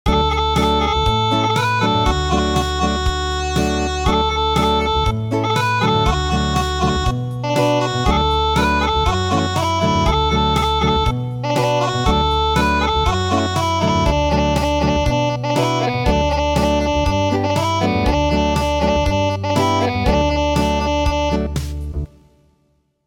Acoustic

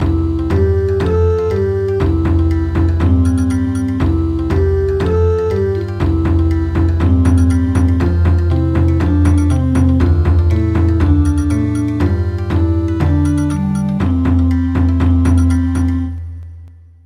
Bon Odori

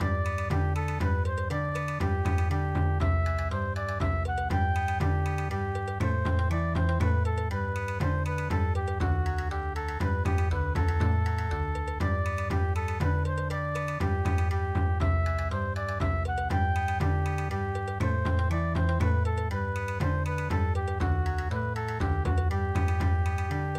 minuet Bon Odori

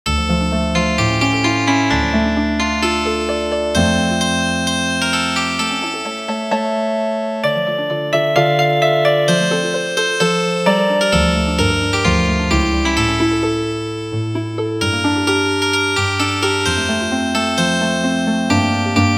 Healing Harp, midi traditional

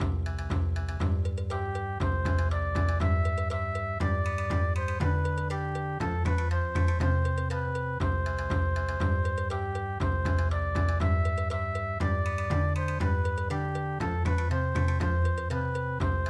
Bon Odori, traditional

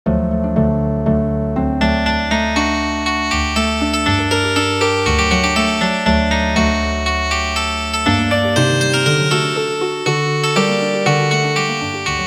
Healin Harp; midi; traditional

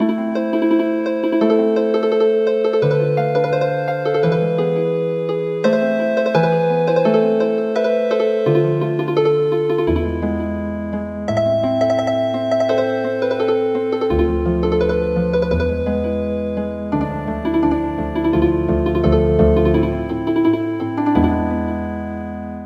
Healin Harp

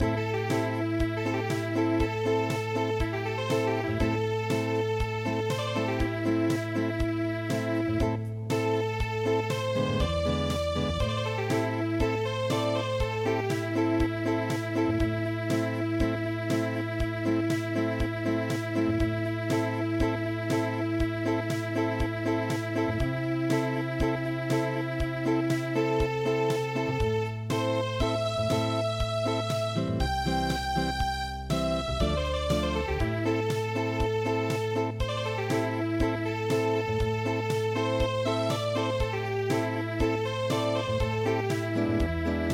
Unplugged; gang won do arirang

